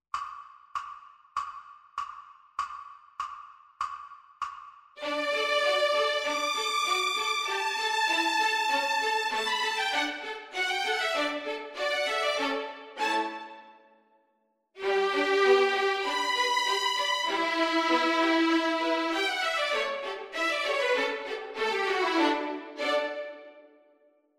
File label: Two Violins, Backing Track; Mélodie; de Bériot; ViolinSchool